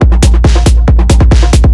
drm 138, Techno, drum-loops; Techno ID

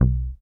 P bass mutes

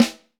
Vintage Snare Drum; Q Up Arts; Johnny.C's Vintage Snare Drum; 4X14 JCSM

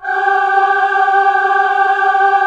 SYM CHOR G 2, Korg; Back In Time Records; Korg Universe VOL-1; Korg SYMPHONY2

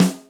snare, Millenium MPS-400

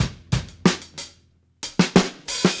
Call And Response Drums Fill, 70s Analog Funk; Sonic Foundry (Sony Creative Software); What it Is!

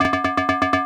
Bongo Loop; Super Fantasy Zone; M8; Genesis

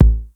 TR909 BD; East West - Global Sounds

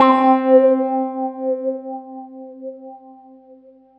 KEYS, Drum & Bass, 160 BPM; Ueberschall; Drum & Bass Flash Trackz

